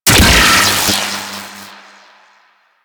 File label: Chain Lightning Rifle Shot B; Crp Chain Lightning Rifle